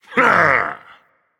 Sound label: Yay, Dragon; fafnir